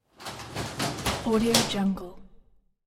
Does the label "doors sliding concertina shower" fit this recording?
Yes